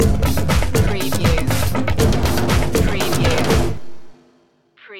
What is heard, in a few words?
afrika call